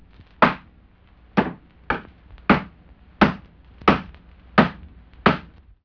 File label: hammer